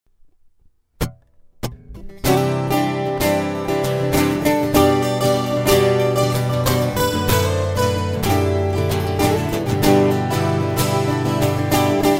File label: Open Chord Jam